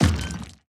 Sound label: car stone impact; factorio 2.0.55